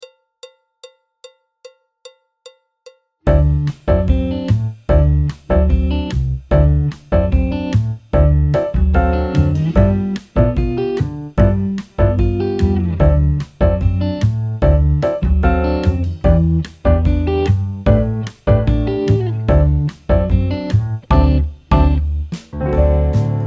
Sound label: piano; Jazz Syllabus Level 2